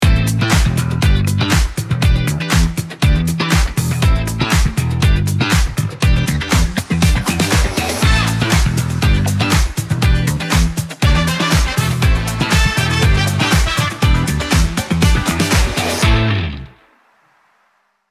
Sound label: music